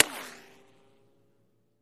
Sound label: Bullet Ricochet Sin